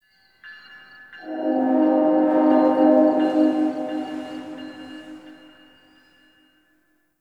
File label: Distant Train D; Warframe; Ambience; NineteenNinetyNine